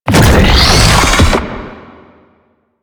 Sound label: Slinky Dead Zone Charge; Warframe; Man In The Wall